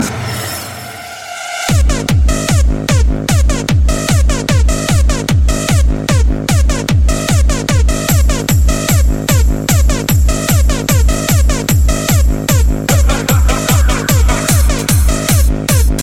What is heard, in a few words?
haha beat ringtone